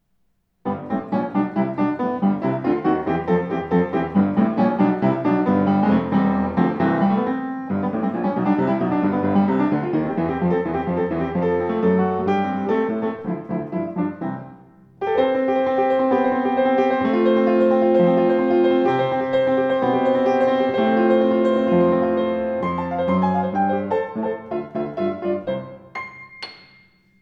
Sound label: Rag, grotrian steinweg; 124 grotrian steinweg